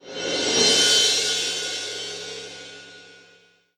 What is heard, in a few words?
Cymbol Roll